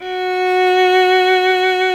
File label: violin F#4; Greytsounds VOL-1